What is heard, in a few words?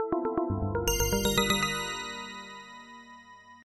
calm tone